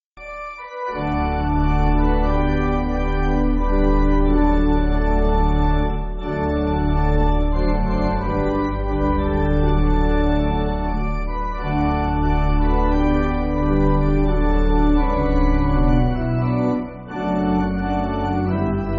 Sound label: Pipe Organ, Free Music Backing Files for Churches; There's A Star